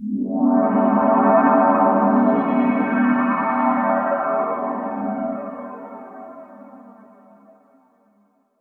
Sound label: VFX Atmos